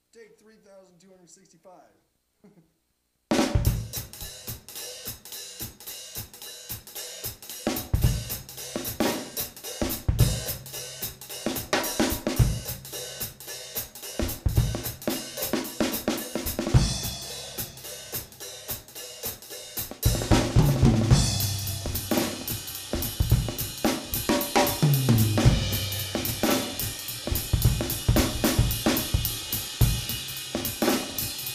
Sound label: Little Swing, 220 BPM, Some basic swing and big-band-style playing.; drum